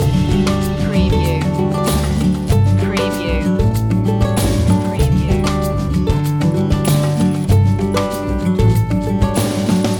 think casual loop

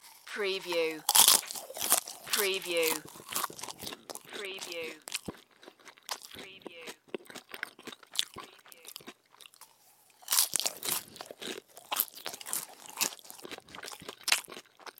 Eating Popcorn

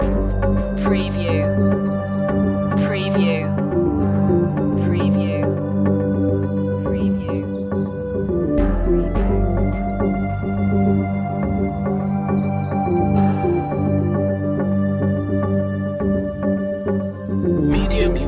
perfect bliss loop

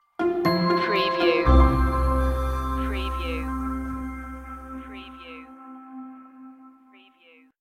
simply joyful stinger

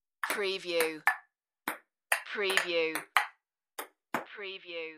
Ping Pong

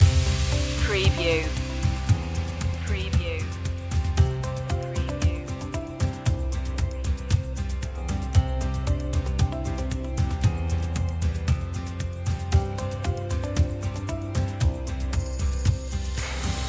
time with you loop

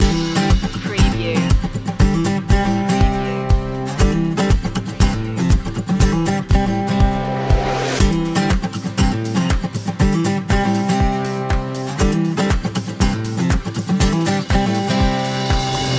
uplifting acoustic guitar loop

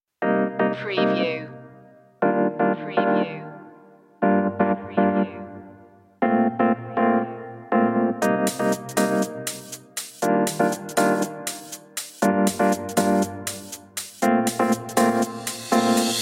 funky loop intro; media music now

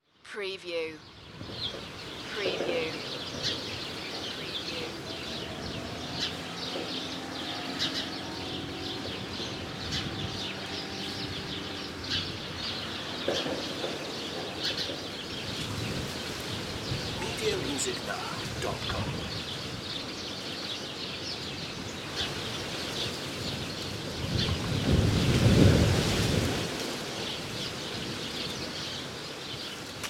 Rural Ambience

